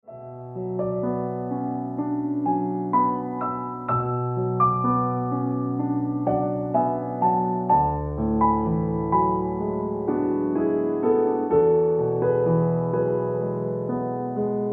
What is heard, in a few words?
Ringtone Piano Music